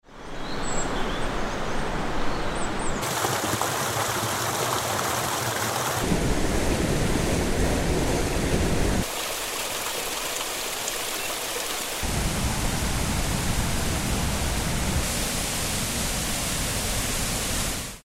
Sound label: Waterfalls Wales; sound effects pack